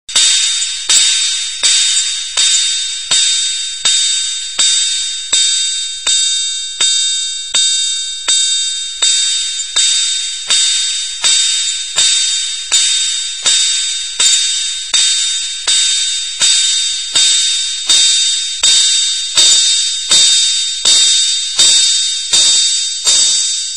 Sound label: vary sizzle, dr110